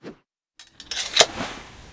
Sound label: Inf Weapons Foley; Red Orchestra Ostfront 41-45